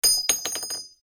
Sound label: cart